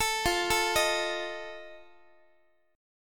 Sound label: F 7th, Guitar; arpeggiated; x,x,12,15,13,14